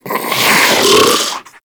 Infested Diseased Ancient Breath; Warframe

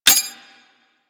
Quill Dart Gun End Clip Mech; Warframe

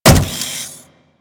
Riot Moa Step Default, Footsteps; Warframe

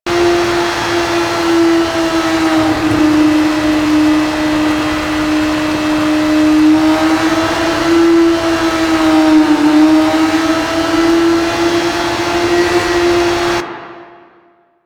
Motorcycle Loading Card Loop; Warframe; Hub; Nineteen Ninety Nine

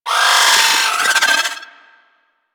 Drone Vocal Player Spotted; Warframe; Venus; Venus Terra Attack Drone

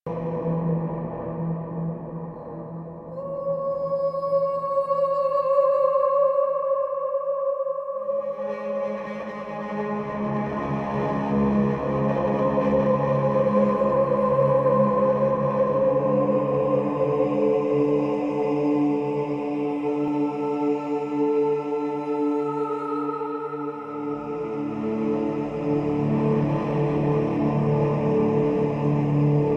Caves Amb Pads; Dynamic Music

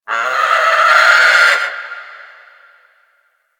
Forest Creature Mid Far; Warframe; Grineer Forest

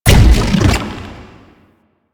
Octopede Lash Limbs Beam Stop; Warframe; Man In The Wall

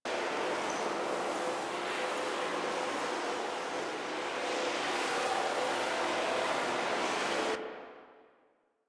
Portal Drifter Enter Loop

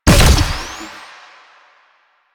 Fire Shot, Weapons; Duviri; Incarnon Adapters; Incarnon Dera Vandal

